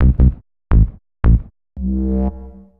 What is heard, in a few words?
Bass, Futurist Drum & Bass